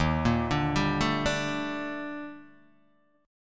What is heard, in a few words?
Eb Major Sharp 11th Eb Guitar Open D